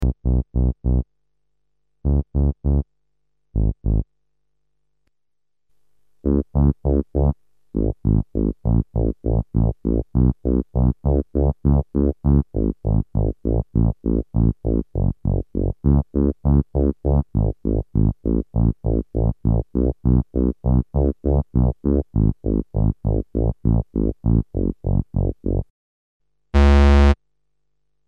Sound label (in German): Behringer Model D mit MXR Phase 90